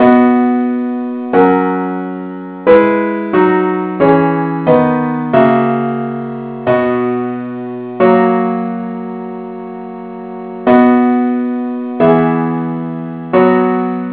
parts only key b

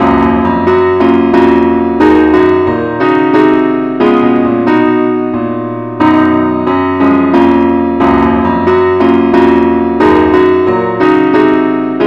mcintosh keyboard only key ges; Amazing Grace; John Newton

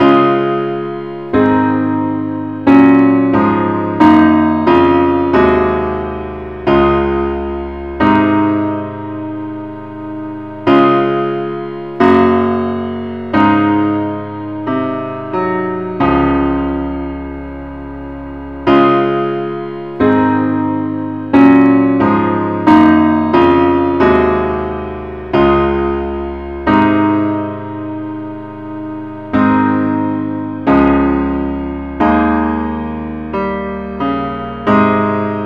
parts only key cis

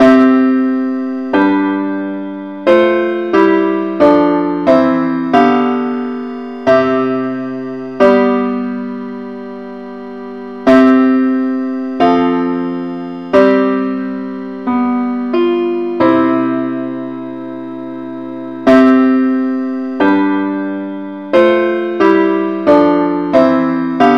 parts only key c

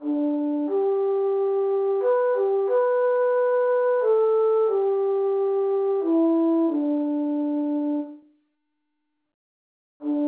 amazing grace traditional

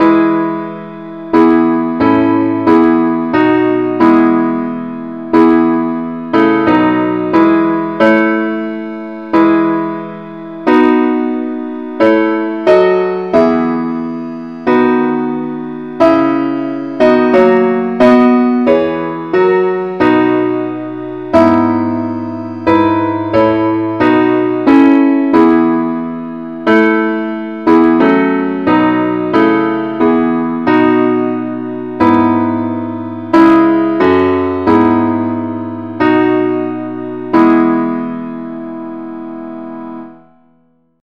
parts only key e; christe Sanctorum

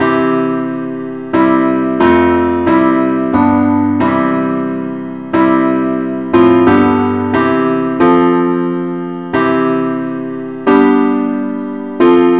parts only, key C; christe Sanctorum

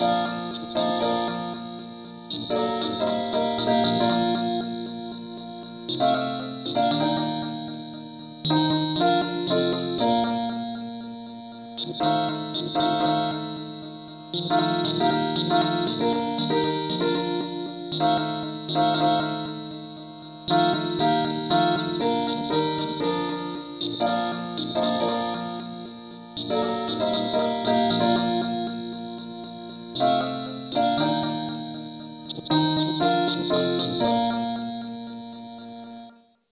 no vocal

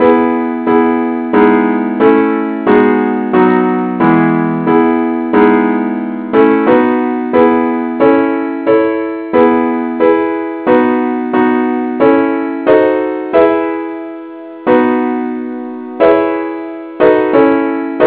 christe Sanctorum advnce acc key e

